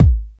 hot kick b key, Royality free kick drum single shot tuned to the B note